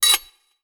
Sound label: sword fight; empire game settlement alert proceed to battle; weapon